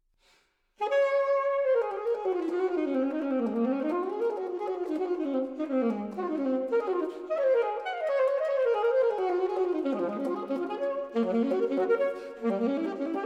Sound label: saxophone performance